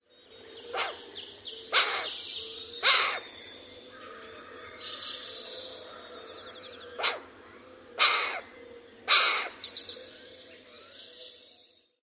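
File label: Cooper's Hawk harsh notes female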